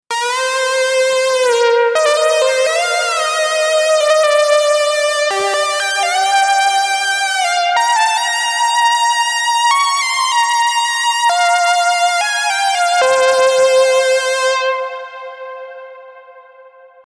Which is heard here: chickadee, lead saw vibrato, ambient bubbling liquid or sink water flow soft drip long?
lead saw vibrato